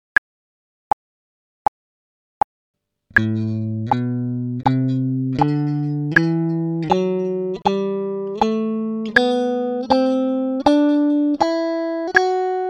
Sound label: 80bpm quart note